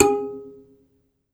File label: BASS KALI, Kalimbas; E-MU Producer Series Vol. 7 – Old World Instruments